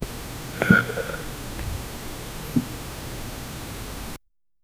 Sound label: waking up neck pain, bedroom